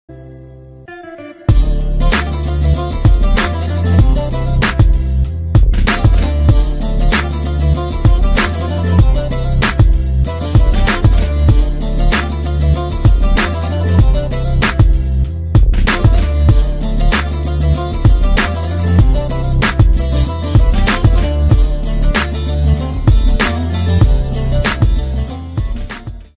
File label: Classic Saz